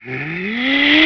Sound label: bowser inhale; mario 64